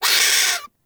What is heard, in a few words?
Forest Rodent Vocal Pain; Warframe; Characters